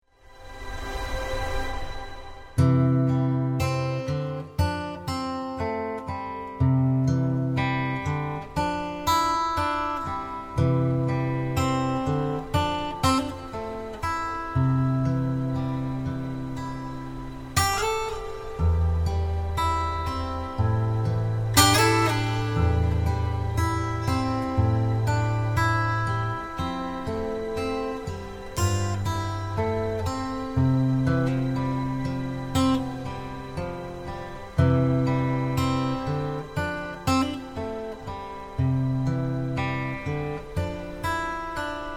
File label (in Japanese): インスト